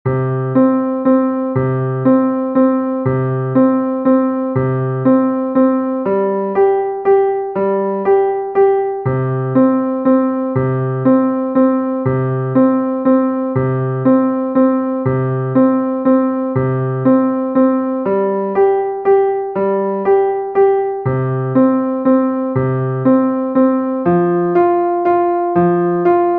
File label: Valse piano